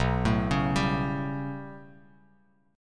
B 7th Suspended 2nd Sharp 5th down Bass Standard, chord; B7sus2#5 arpeggiated; JGuitar